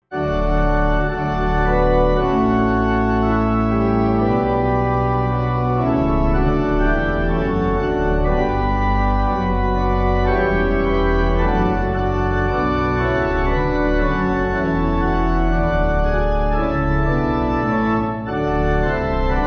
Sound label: Pipe